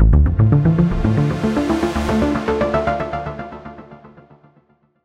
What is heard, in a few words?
space game intro music